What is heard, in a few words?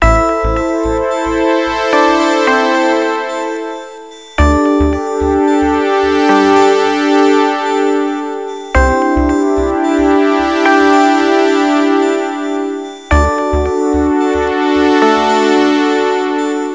Quiz Loop; Visual Logic Training; NDS